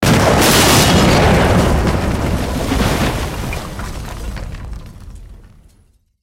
building crash

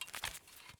sks hand; Escape from Tarkov

